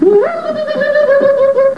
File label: swoo woo